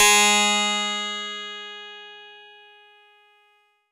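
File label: Harpsichord DX100 G sharp 0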